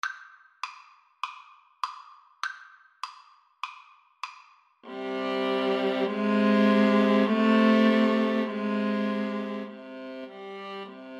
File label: viola, Viola Duet